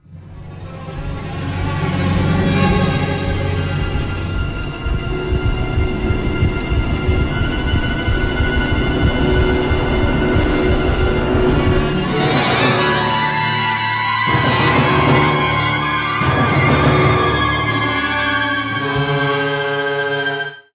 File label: Music Fight; nosferatu the wrath of malachi